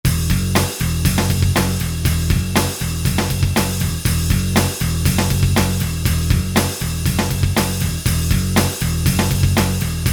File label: Metal